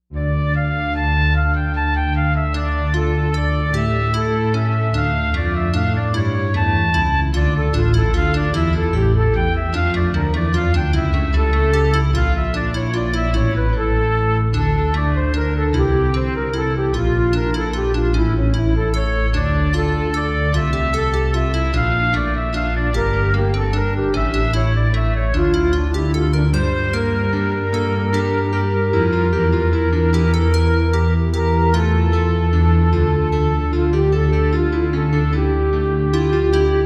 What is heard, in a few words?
Fragmented Flute